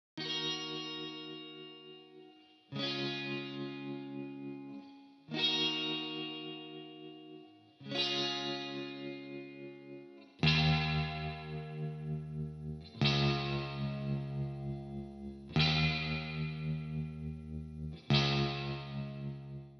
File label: reverb nice